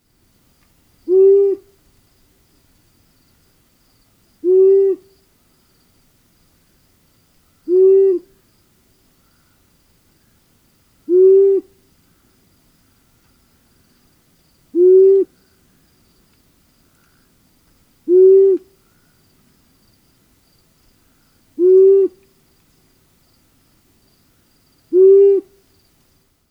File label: long eared owl sound